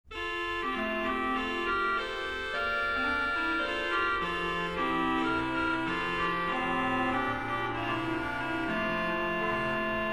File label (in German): krummhorn